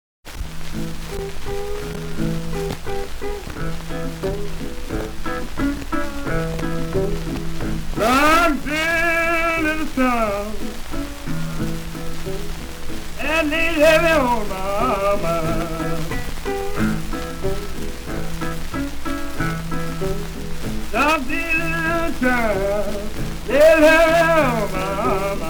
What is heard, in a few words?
Moan; Clarksdale